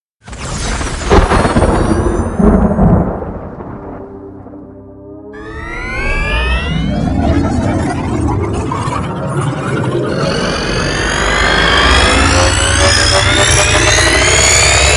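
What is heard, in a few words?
reactor, Active Sound System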